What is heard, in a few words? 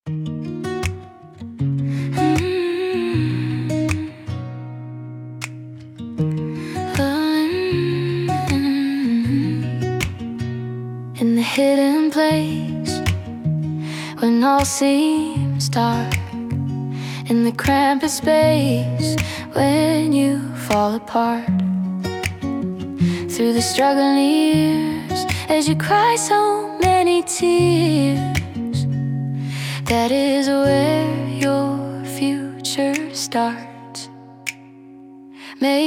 (Acoustic)